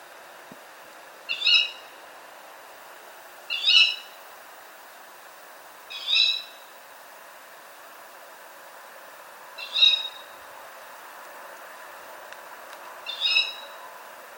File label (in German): junger Waldkauz